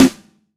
Reg. Snare, Roland BK-5; Snares

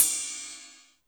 Cym Dark Ride, Drum Machines Alesis D4

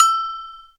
agogo, Yamaha TG-500

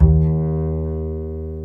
BASS L, Drum & Bass 140 BPM; Ueberschall; Drum & Bass Flash Trackz

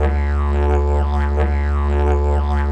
DIGERIDOO; Best Service; World Colours

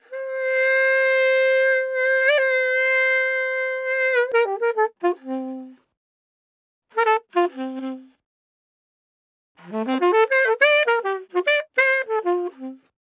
Solo Sax F Free; Quazibeat - African Secret; Saxaphone

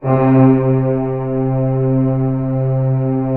ORC C2 PP, Orchestra; Sound On Sound - Peter Siedleczek's Orchestra

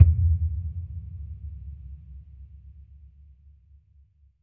HIT NOISE; AKAI S-Series CD-ROM Sound Library VOL-5

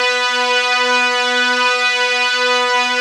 OMNIVERB, ARP Synths, Analog Odyssey

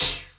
TR kit, techno kit; Pro-Rec - Synthsonic

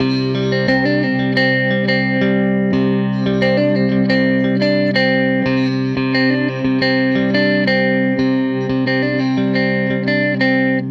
Tele Guitar Loop

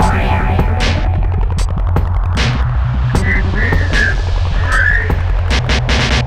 Hardcore Breakbeat, 153 Fmin; Big Fish Audio; Hardcore Breakbeat Action; Dark Star

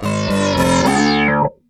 SQUEEZ DIVE, Funk Guitar; 90 s Sample CDs; Zero G

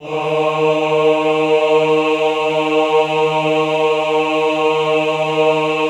AHH D2, choir; Best Service; Extended Classical Choir; AHH MIX

